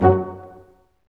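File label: Large Ensemble, Brass Staccatos; Roland LCDP08 Symphony Orchestra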